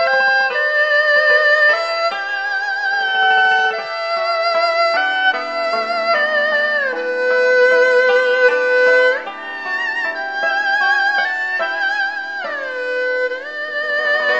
chinese traditional; WORLD

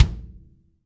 kick, Multisampled Real Kit; REAL LIVE KITS

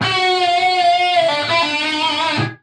straight up guitar lick

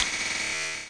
hit machine fun, Electronic Hits

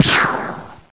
Phaser blast; Cheesy Lo-Fi Sound Effects

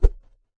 kung fu staff, swings

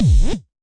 virus reverse hit, Virus Electro Hits; UNUSUAL KITS and FX

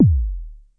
909 bass, drum machines

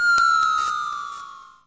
Electro FX; Ueb HE Electro FX

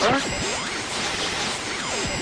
radio noise; Radio Tuning Noises

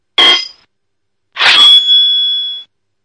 sword clashes; Sound FX Real Life

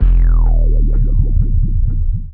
bass wobble drop; Basses

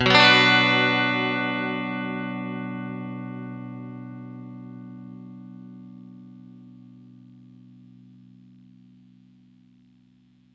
clean chord Bm, clean electric guitar chords

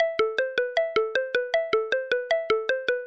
tinkles synth, synth and electronic loops

